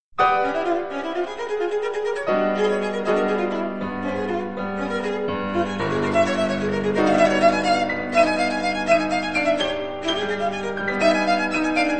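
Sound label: Duo for Viola and Piano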